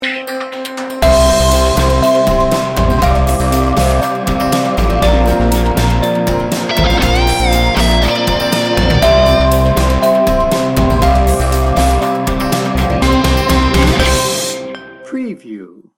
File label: atmospheric and dreamy